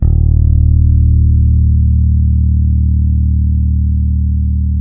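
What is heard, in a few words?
E 2, French fretless bass; Spectrasonics; Bass Legends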